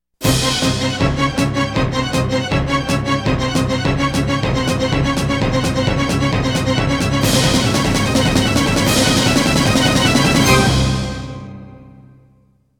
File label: Timer; Sonic Adventure (Unreleased Tracks)